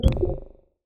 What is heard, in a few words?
chip fail